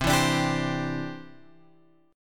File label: C-Major Add 9th, guitar; Cadd9 Chord {8 7 X 7 8 8} strummed